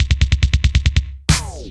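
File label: DRUMLOOP ELECTRO 140; Techno2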